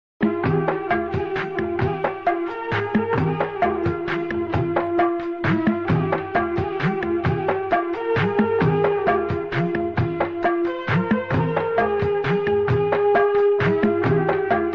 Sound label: Malayalam movie bgm ringtone